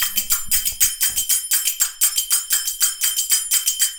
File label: CYMB, Indian Traditions; USB Soundscan vol.45; AKAI